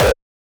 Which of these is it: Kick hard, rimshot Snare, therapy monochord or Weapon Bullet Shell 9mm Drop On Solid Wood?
Kick hard